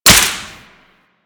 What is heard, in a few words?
Whip Impact Default, weapons; Grn Whip